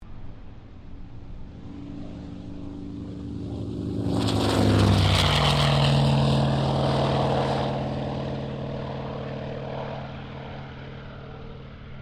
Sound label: AIRCRAFT HAWKER SEAFURY TAKEOFF, Hawker Seafury fighter-bomber; Airplanes; Vehicles